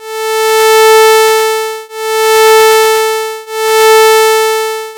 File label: beep